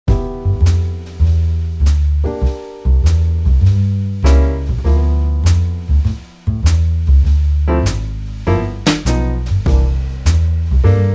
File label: jazz brush; Pettinhouse; Brush kits for NI Battery and Kontakt